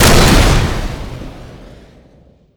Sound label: recoilless shoot; tf2classic